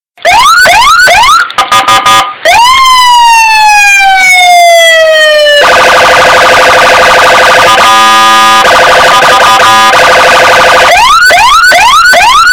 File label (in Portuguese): sirenes de policia e ambulancia